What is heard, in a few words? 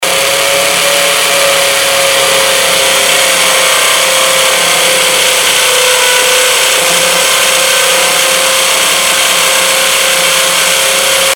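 drill sound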